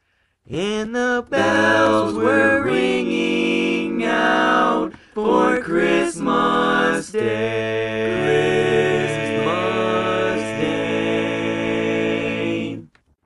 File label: Bari, Barbershop